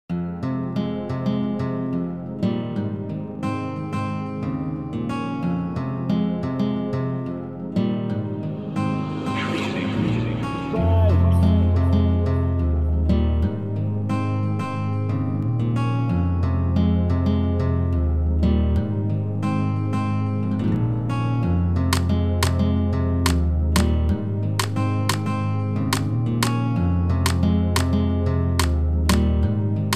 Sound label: Instrumental